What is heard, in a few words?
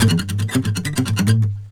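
Country Guitar (Ending); Digidesign; Pro Tools Essential Loops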